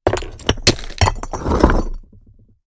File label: Bricks dropped on a pile of stones with debris